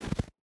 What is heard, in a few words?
footstep hide snow jump land; Rust